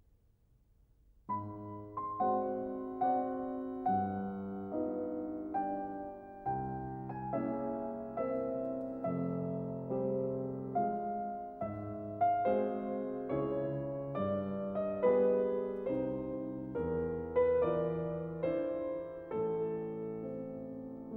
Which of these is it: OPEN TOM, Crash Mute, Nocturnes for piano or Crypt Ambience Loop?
Nocturnes for piano